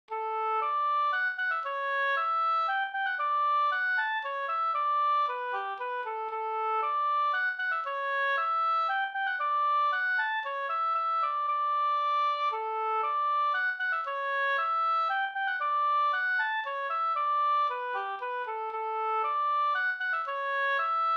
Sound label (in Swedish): slängpolska